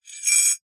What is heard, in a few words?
household cutlery teaspoon movement in coffee mug as if cup tipped while spoon in